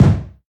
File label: bass drum sample g key